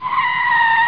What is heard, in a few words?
SLIP; SRALLY